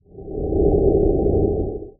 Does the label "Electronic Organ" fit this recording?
No